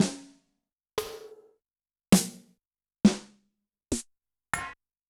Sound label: Yamaha DTXpress-II Snare